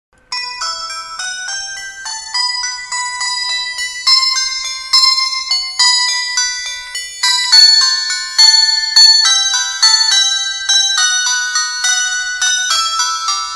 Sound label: ringtone